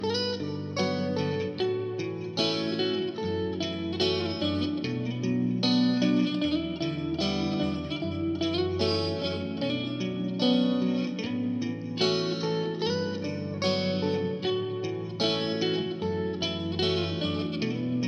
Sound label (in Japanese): オルゴール